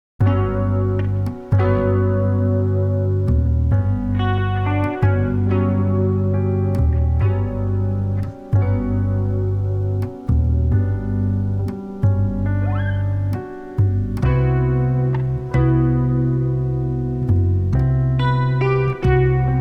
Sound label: new drums mix